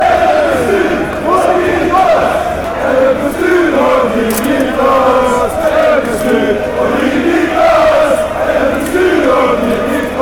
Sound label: chant; beerschot